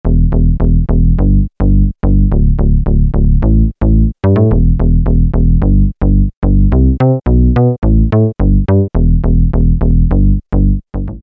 Jupiter Sus, Peavey DPM Spectrum Bass

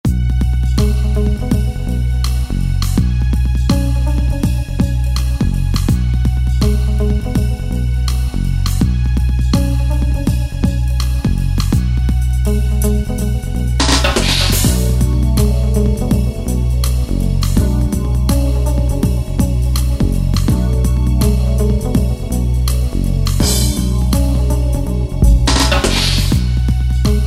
Ambient, Yamaha DJX Styles

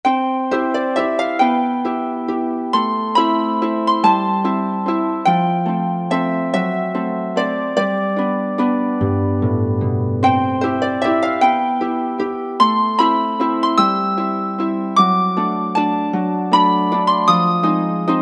Solo Harp

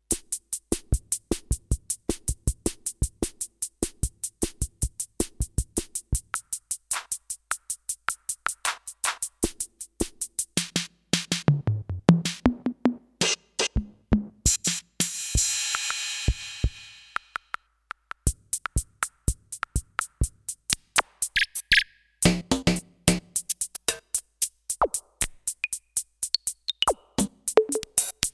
Attack

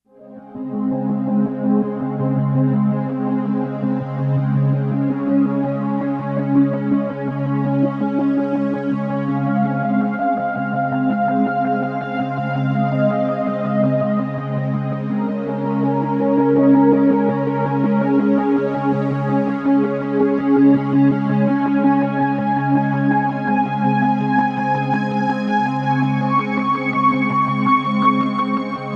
Step Pad 880, Roland SC-880; Factory Patches

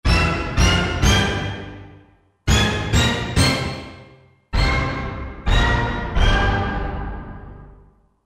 Orchestra Hit, Roland JV-2080

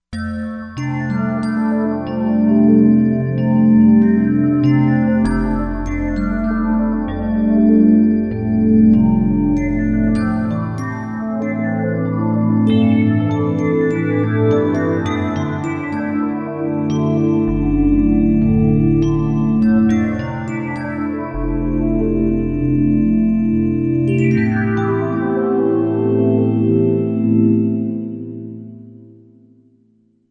Xylo Wave, Korg MSC-07S Synth

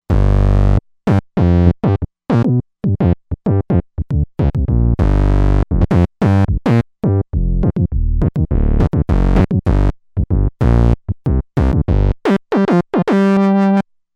Bass Vega Bass

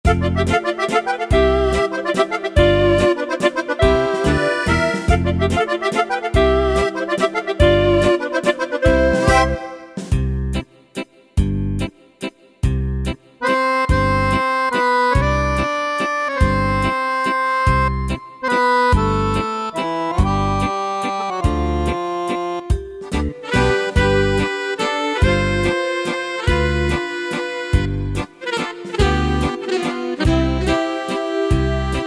Italian Mazurka, Yamaha PSR-3000 world style; Factory Styles

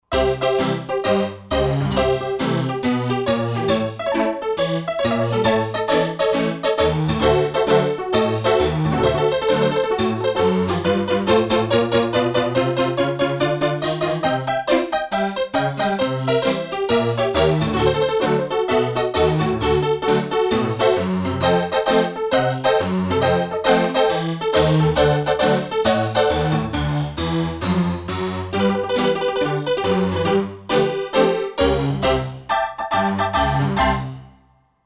Honky-tonk Piano, Roland JD-990; Factory Patches

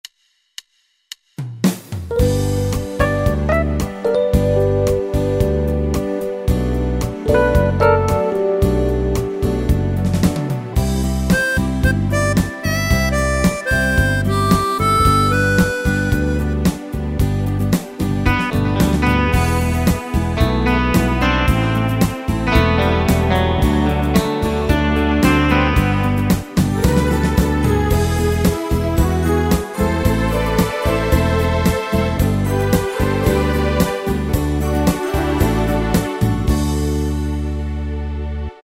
8 Beat, Yamaha PSR-3000 pop & rock style

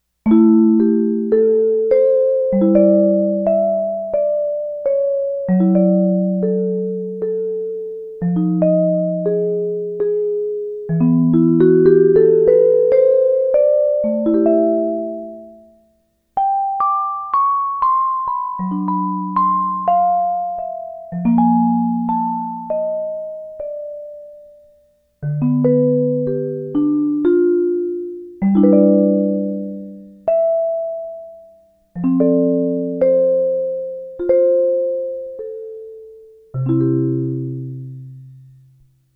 vibraphone

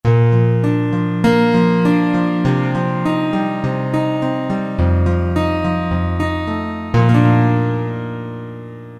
Guitar Frets, Roland PN-D50-02

